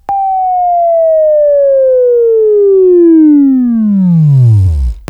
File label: WAV Right, Sweeping; Sweeping Training program